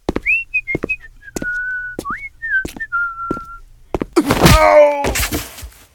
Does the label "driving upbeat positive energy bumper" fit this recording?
No